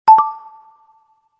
Alert Subtle, phonetones Motorola; Q9h

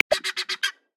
Helmeted Guineafowl; Xiaomi Redmi-Note-8-Pro; Africa

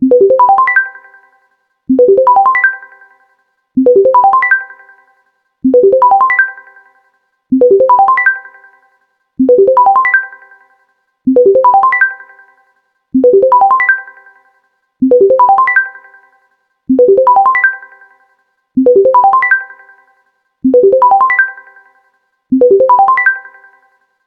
Bubble, ringtones

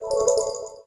hold cube drag; SKY IM-A910S-VEGA-Iron-2

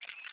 Click; Alcatel; PC-Suite-4.17

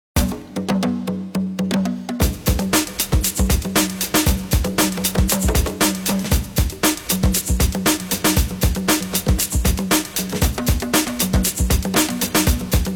Groove time, ringtones; Sony Ericsson W995

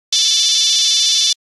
Nokia Buzzer 8310 Low; Windows Phone apps

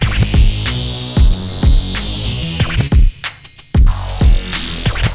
Hiphop; Google; NewWave Labs

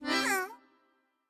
cable; LG; F520L-AKA; Yoyo

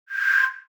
HAPTIC DIVIDER DELETE; Sharp; AQUOS sense lite SH-M05

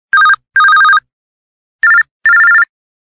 Telephone

